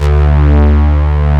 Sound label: OB STRINGS, OB Synths; E-MU Formula 4000 Series Vol. 3; Analog Odyssey